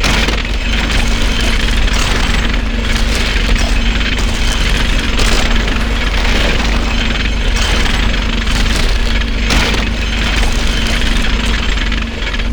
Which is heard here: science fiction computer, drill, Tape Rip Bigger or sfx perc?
drill